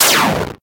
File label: 8bit destroy; memorize fast